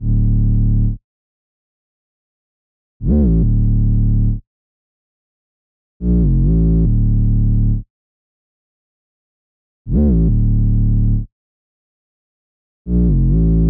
Sub, 140 BPM D Min; Merciless

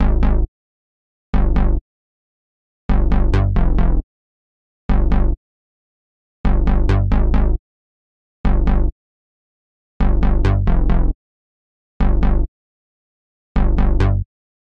135 BPM C Min Juno-60 Bass; Loop Stems; Cymatics; Fantasy Synth Sample Pack; Fantasy - Tell Me; JU60+OBX+REV2

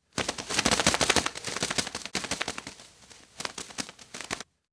Wing Flaps Multiple Birds Fly Away; Fairlight Sound Lib

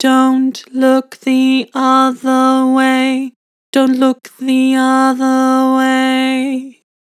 Vibrato Dont look the other way, Vocals; Harmor

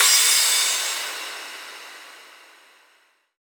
Crash Cymbal, House; GhostHack; Ghosthack Advent Calendar; House Kit

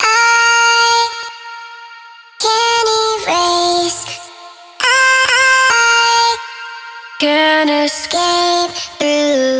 Vocal Chop 100 BPM C Maj; Vocals, Chop Loops; Cymatics; Euphoria

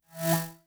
Flash

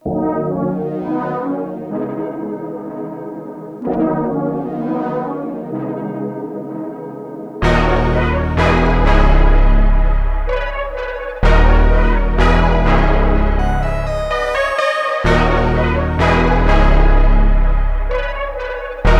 126 BPM G# Min, Melodies, Analog; Utopia; Cymatics; ZODIAC Beta Pack

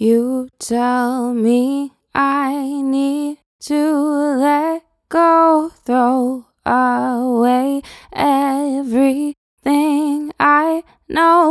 Acapella B Minor 80 BPM; Vocals; Ghosthack

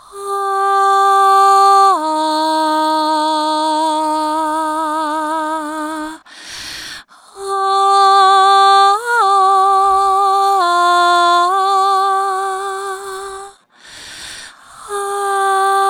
Middle 8 Vocal; Construction Kits; VOCALS; Cm 65Bpm; Ghosthack; SkyRealm; Kit 02 - Solomon Hill

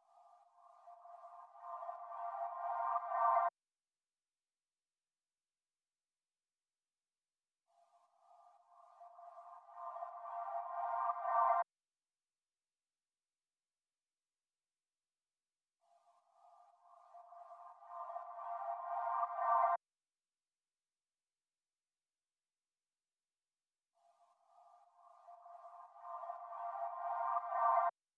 118 BPM G Min Reverse FX; Cymatics

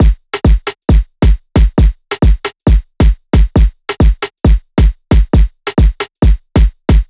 Drum Loop 135 BPM, Hip Hop; Drum Loops; She Goes; Cymatics

